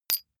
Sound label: medium metal impact medium short